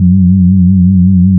LEAD, Nord; Wizoo - Powered Nord; LEAD PAD HRN

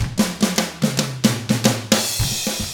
110 SMBA samba; ILIO; Double Platinum Drums 1

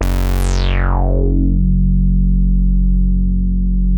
F#2, Bass; E-MU Producer Series Vol. 6 – Analogia Project (CD 1); Boom da Bass 2

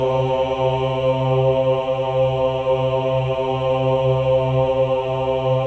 OH C3, CHOIR OHS; Spectrasonics; Symphony of Voices

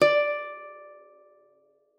D5, GUITAR; Xsample VOL-2

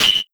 Warsaw Hit, kit; Sonic Foundry (Sony Creative Software); Esoterik Beatz

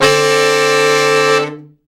BRA SECT; BRA SECTIONS

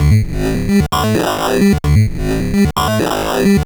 MiniBrute Loop, 130BPM

